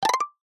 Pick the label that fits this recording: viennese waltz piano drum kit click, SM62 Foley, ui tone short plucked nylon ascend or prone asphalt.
ui tone short plucked nylon ascend